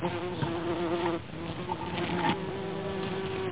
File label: bees